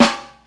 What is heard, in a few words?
Acoustic Snare Sample C Key, steel snare drum sound tuned to the C note